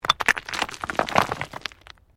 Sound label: STONES MOVE